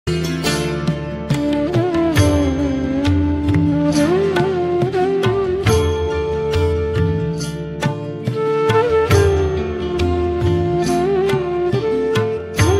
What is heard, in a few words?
swaminarayan dhun ringtone flute